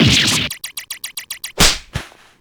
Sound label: damage; herman